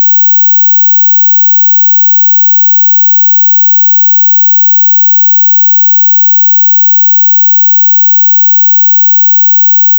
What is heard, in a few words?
22kHz